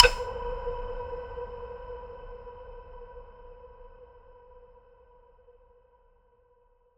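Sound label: Design Tonal Ping Cinematic Clean; MatiasMacSD; Informer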